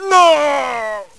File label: noooh